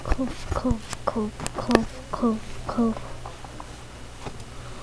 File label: cough